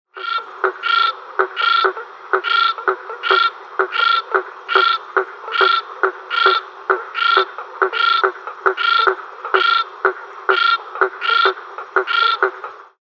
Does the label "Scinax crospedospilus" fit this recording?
Yes